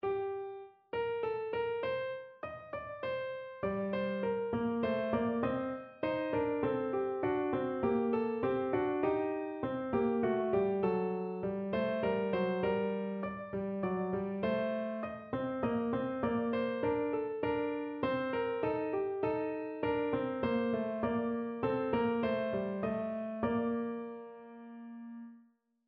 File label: Canon Example